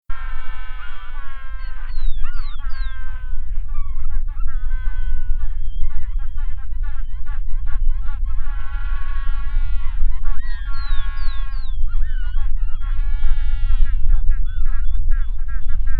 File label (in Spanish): Pinguino Patagónico, Spheniscus magellanicus, Magellanic Penguin; cantos; Spheniscidae